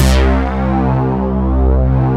TECHNO; Pro-Rec - Synthsonic; Z1 TECHNO

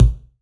BD, BASS DRUMS; EdgeSounds

